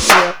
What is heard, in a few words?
INS TIMB REV, TIMBALS, Ragga & Dub Reggae